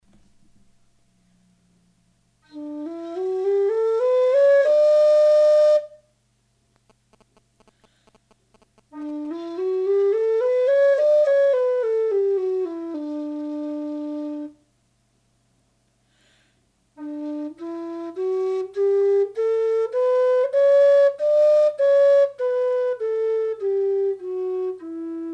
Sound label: whistle